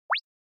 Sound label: Anime Motion Single